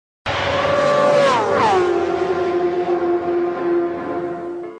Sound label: moto c plus ringtone